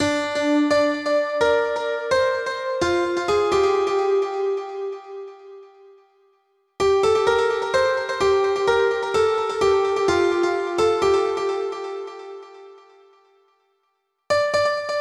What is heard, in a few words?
Melody; super crush k.o.